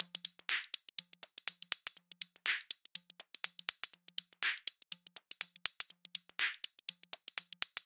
122 BPM Micro Drums; MMB Studio Soundtrack Essentials

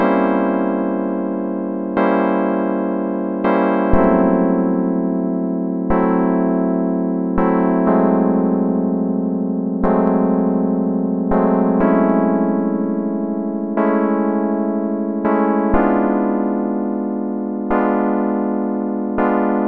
122 BPM Tonic E Amaj Fmin Chord Progress; mmb chord progressions essentials

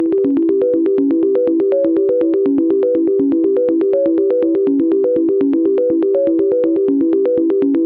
122 BPM Arps D maj B min Tonic E; arp essentials; Flux Arps

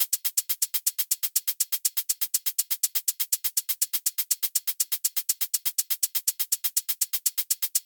Hat Groove Hats, 122 BPM; mmb drum arsenal vol 1

